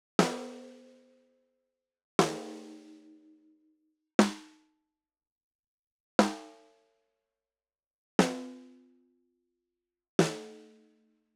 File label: Snare Acrolite